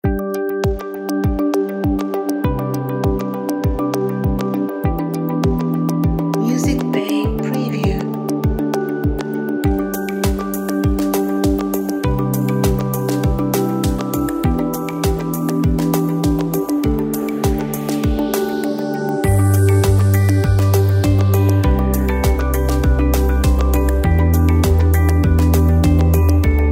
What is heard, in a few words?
Ambient Corporate